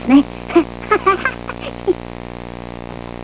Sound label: laugh